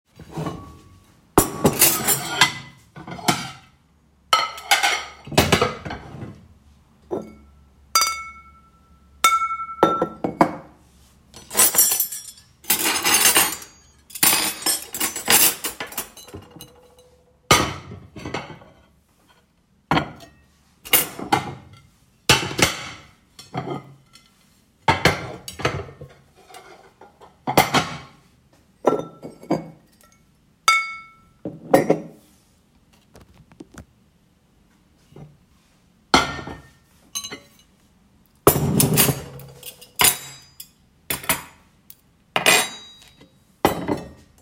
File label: Set the table